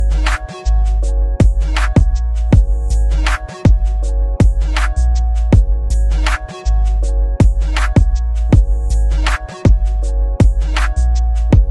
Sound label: english music ringtone